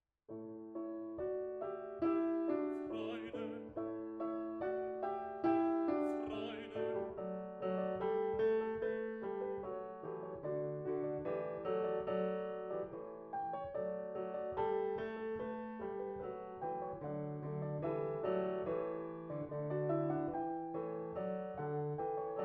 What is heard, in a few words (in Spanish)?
Primera entrada Altos